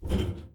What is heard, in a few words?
boots metal; Apotheorasis